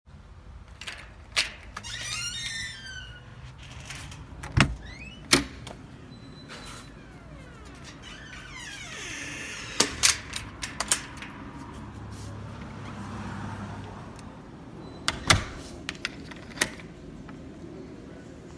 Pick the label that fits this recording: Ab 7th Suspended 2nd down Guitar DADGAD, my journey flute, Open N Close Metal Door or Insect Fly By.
Open N Close Metal Door